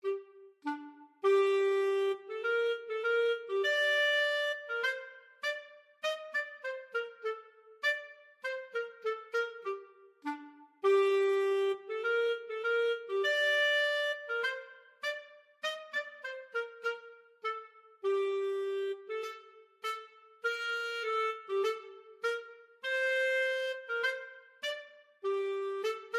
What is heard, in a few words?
clarinet duet